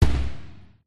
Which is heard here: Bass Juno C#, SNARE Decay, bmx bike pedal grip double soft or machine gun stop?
machine gun stop